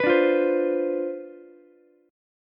C Major 7th Suspended 2nd Suspended 4th C down Ukulele, chord; CM7sus2sus4 strummed; JGuitar